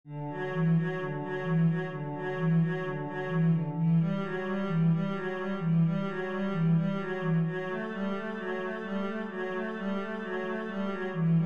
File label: etude, cello